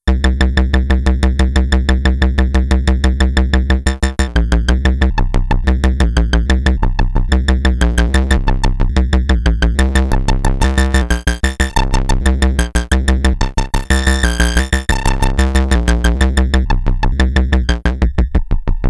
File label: pseudo arpeggiator